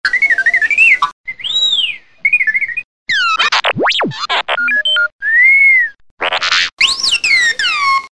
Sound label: R2D2